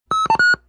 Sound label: 3 Tone Hi; noisetoys